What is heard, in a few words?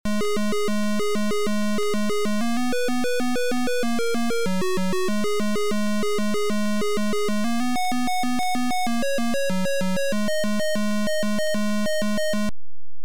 Title, Beeper; Alien 8